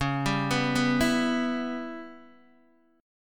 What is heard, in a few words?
C sharp Minor 7th Flat 5th, Guitar DADGAD